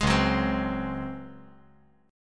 Db Major 7th Suspended 4th Db down; strummed; JGuitar

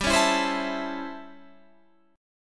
A 7th Sharp 9th

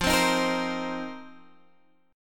Bb Suspended 2nd Suspended 4th F

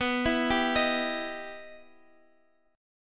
E Minor Major 7th B

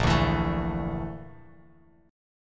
A sharp Major Sharp 11th, Bass Standard; strummed; JGuitar

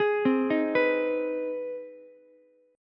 C Minor Major 7th Sharp 5th, Ukulele chord; CmM7#5 arpeggiated; JGuitar

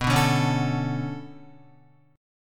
B-Minor 9th B down Guitar; Bm9; strummed; JGuitar

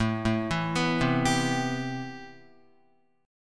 A-Minor 9th A Guitar; Am9 arpeggiated; JGuitar

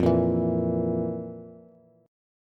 F Major 7th Suspended 4th, Bass; strummed; x,8,8,9; JGuitar

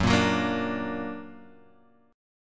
Bb Augmented Gb

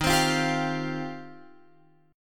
Eb 6th Add 9th, Guitar, Open F; strummed; x,10,12,12,10,12

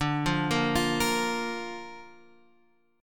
D Minor Sharp 5th D, guitar; x,5,3,3,6,6; Dm#5 arpeggiated

